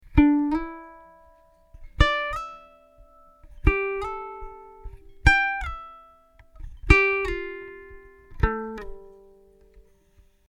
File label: slides, ukulele